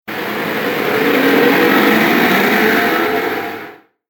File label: Future Power Bike; Spacecraft Sound Effects; Sci-Fi Sound Effect